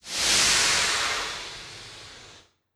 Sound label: Tank Steam